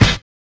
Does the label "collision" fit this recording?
Yes